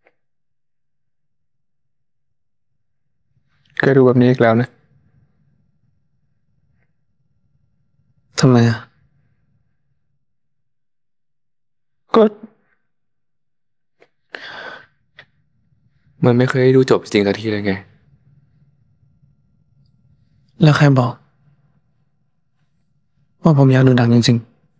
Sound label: vocals